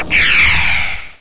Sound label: laser; Monster girl quest Part1,2,3; Visual Novels